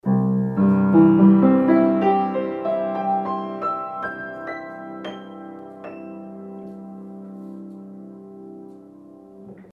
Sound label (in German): Piano, Zoom H4n